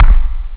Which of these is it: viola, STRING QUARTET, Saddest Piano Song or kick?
kick